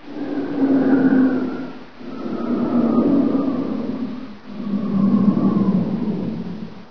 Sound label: fan off; fans; Source Engine; bshift